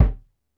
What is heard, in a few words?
Br Kick alt; Roland BK-5